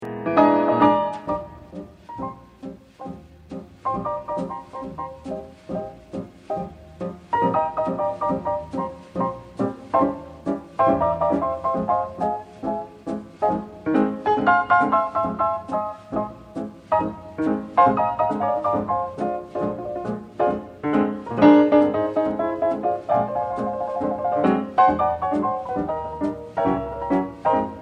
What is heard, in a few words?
petite waltz bounce